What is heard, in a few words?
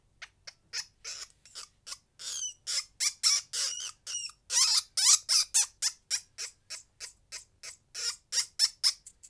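Eolagurus luteus adult; Yellow steppe lemming; Series of discomfort calls of an adult male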